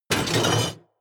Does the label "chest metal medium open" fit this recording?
Yes